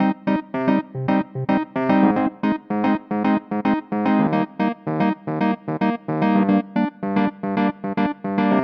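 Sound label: 111 Pop E-Piano Eb major; Pop Rock; E-PianoClav; Pro Tools Essential Loops; Digidesign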